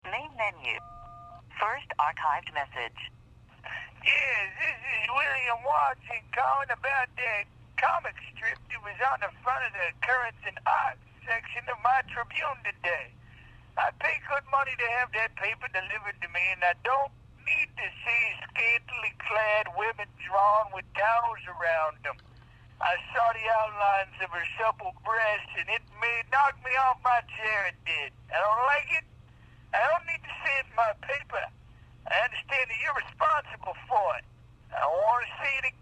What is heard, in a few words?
beeping